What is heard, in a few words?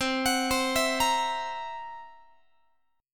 C 7th Flat 5th C down Guitar; C7b5 arpeggiated; x,20,21,22,20,21